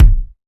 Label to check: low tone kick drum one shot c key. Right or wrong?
Right